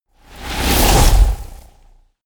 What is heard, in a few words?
whoosh flutter